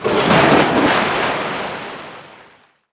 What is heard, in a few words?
crash